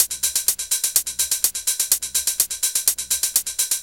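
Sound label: drum loops, right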